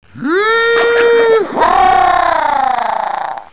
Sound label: drown; quake2; fat